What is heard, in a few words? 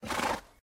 moose hurt; mob